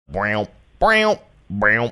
singing three notes

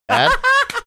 funny laugh

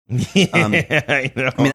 laughing saying i know

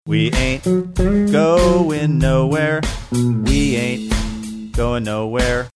singing we ain't going nowhere

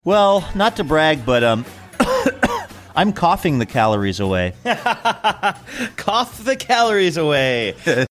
coughing calories away

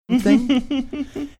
cute laugh

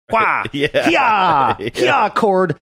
hwah heeyah heeyah cord

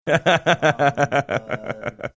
chuckling

